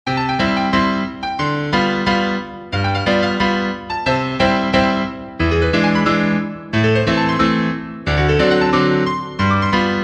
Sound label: piano, sheet music; The flip flap